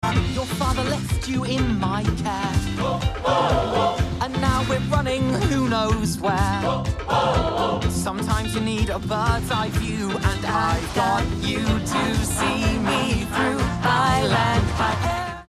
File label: Song; Mufasa Song